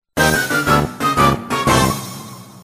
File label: miss music